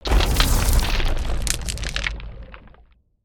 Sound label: Ice Rail End